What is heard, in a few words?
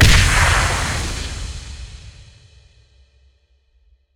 big Win Start; gorillakingdom